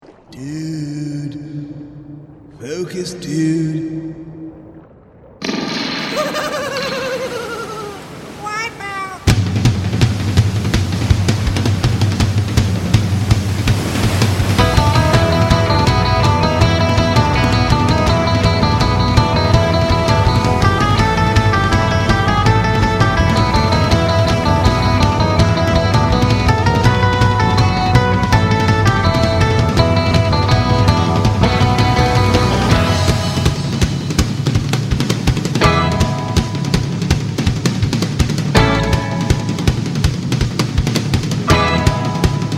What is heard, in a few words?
Instrumental; Finding Nemo; Disney